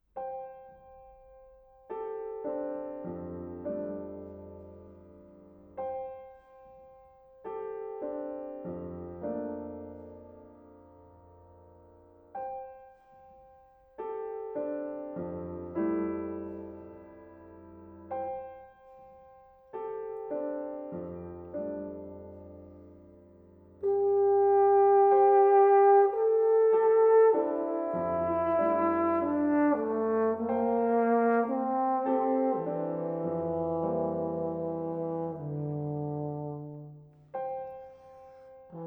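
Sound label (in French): Trombone et Orchestre; 2. Cantilena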